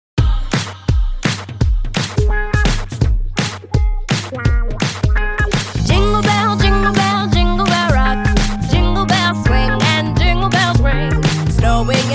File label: Jingle Bells